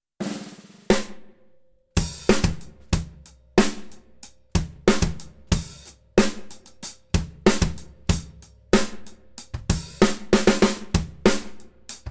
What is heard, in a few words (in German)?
Supralite Hoch mit Gelpad